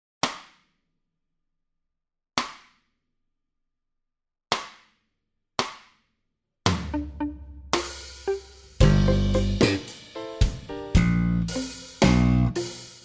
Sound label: Theme Slow Base Basso